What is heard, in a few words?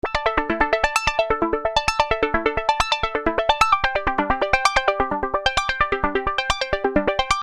Arpeggio 130 E, Techno Synth Loops; Sonic Foundry (Sony Creative Software); Signals I